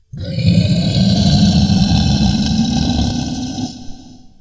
Low Monster Roar

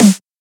Punchy Snare Small Tail

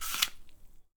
Apple Crunch

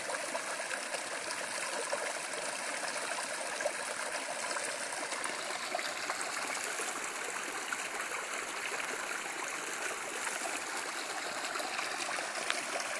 Babbling Brook

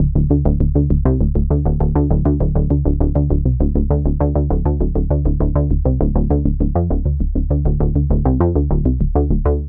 Polysix Chug

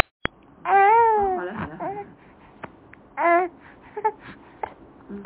Baby

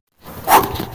Blow Woosh

